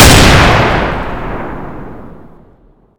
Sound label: scout, g2 contender; weapons